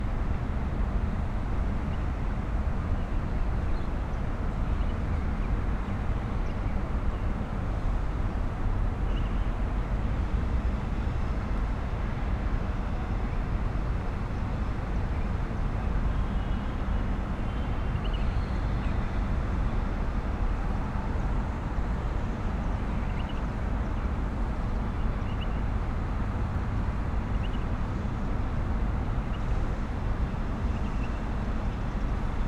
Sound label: AMBIENCE City Plazza, Rumble, 8AM, Barsha Heights, Dubai, UAE; Articulated Sounds; Dubai Megapolis Sonic Bed